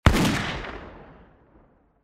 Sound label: gun